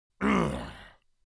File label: Orc creature short growl